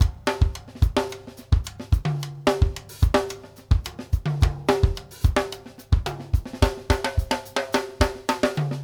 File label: BASIC B, 108; Sampleheads; New York City Drumworks VOL-1